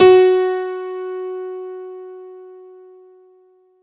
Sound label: F#3 DANCE, ANALOG SEQ; Roland JV 2080